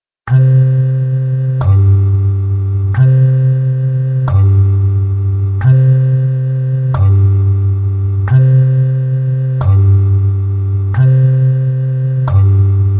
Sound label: Jingle Bass Walk Slow, piano